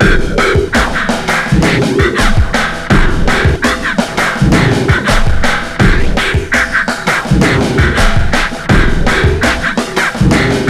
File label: WEIRD BEAT, 83 bpm, Breaks; POTBreaks